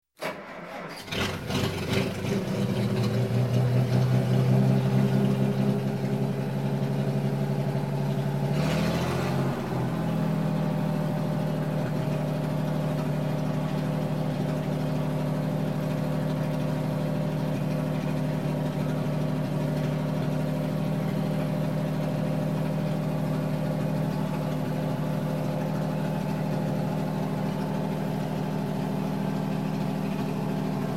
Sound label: Boat Inboard - Start, Idle, Rev, Shut; Shephard; Sound Ideas; Series 2000